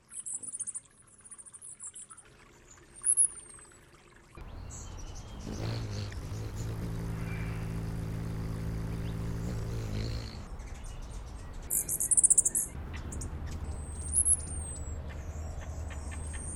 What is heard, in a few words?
costas hummingbird